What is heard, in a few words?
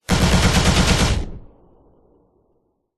science fiction blaster gun automatic shoot fire rounds; zapsplat